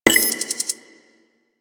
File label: Moa Data Pad Press; Warframe; Quests; The New War; Veso